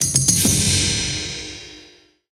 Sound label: UI Levelup